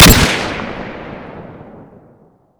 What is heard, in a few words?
fnfal; Modern Warfare